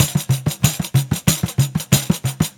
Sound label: Pandiero, Brushes, Perc Loops; Sonic Foundry; Loops & Samples Drum Tools